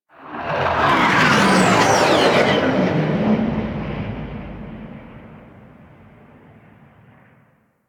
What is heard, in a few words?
Ship Fly By B; Sentient; Warframe; The New War; Plains Of Eidolon; Mission One